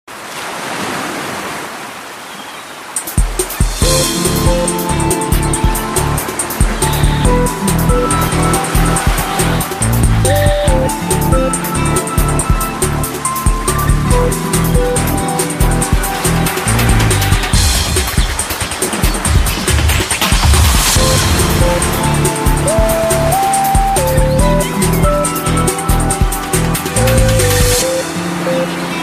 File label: Samsung galaxy note